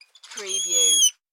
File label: Spider Monkey Screech